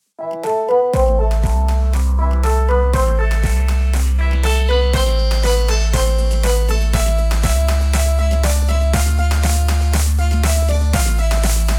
bella ciao dance house version background vlog music